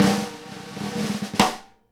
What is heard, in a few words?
snare ROLL HIT, Live Snares; E-MU Producer Series Vol. 8 – Platinum Phatt (CD 1)